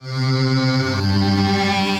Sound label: Overtones, Transformed Voices; Voice Box; Chromatic Voices